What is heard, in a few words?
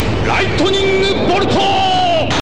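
lightning bolt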